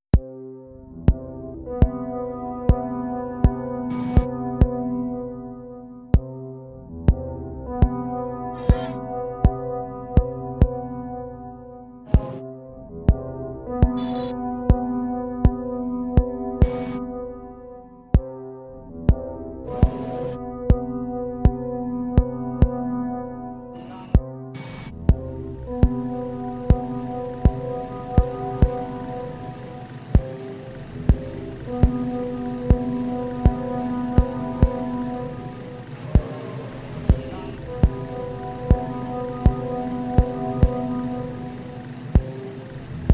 street sounds

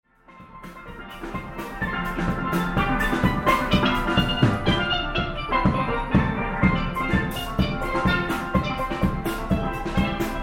steel band oxford street

